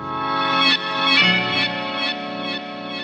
keys, Phonky loops

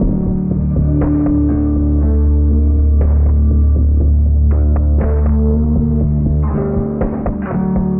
guitar, Sad

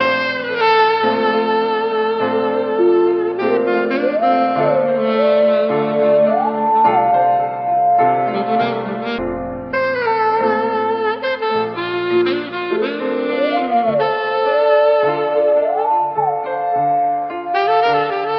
sax vs g-synth; Loops; Phonky

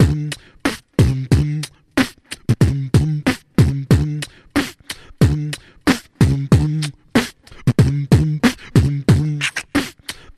bum bum, beatbox; Drum loops

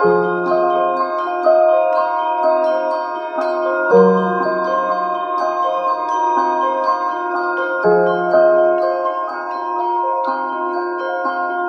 piano, Sad

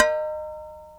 clay pot, Percussion; Misc Percussion

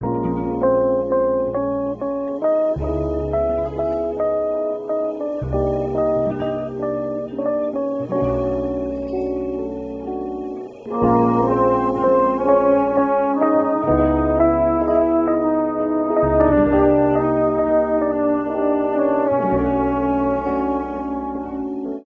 keys and strings of sadness

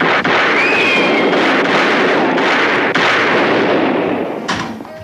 gun shots; Crash Bang Boom

